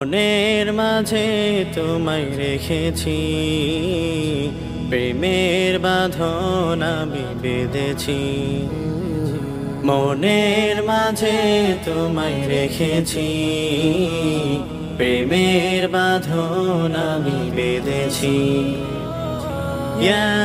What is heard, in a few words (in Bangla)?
গজল, islamic; bangla; হদয়সপরশ